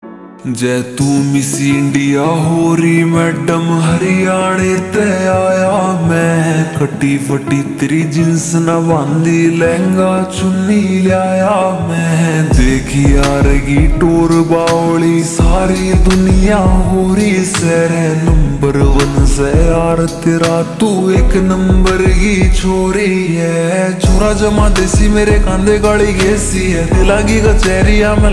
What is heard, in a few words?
Slowed Reverb Ringtone